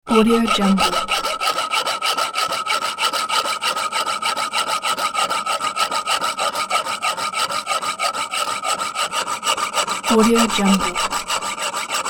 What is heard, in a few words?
hack saw